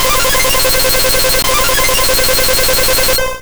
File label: Sky Attack Hit; Pokémon SFX Gen 2 Attack Moves; GSC